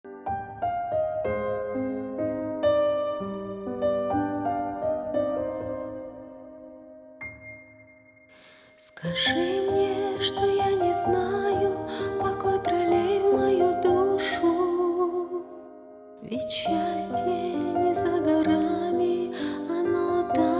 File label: Piano